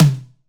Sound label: Tom, D; KSHMR